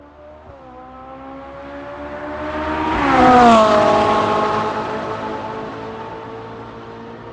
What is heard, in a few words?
Ferrari Sms